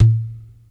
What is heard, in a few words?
PERC, ETHNIC DRUMS; Crypton - DrumBase5000